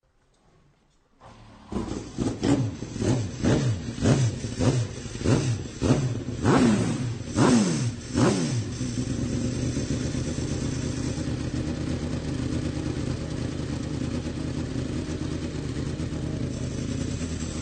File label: Yamaha Yz-rm1 Sound